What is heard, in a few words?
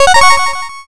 start